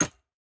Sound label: footstep; smite; osiris